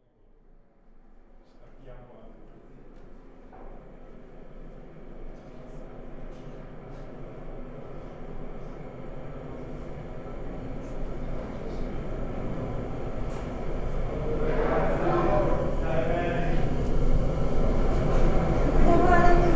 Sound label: ambiance; Microphone, computer and three loudspeakers. This is an ambiance recording of the venue during the installation.; Sounds for Giottolandia